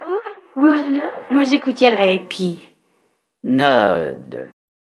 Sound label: jingle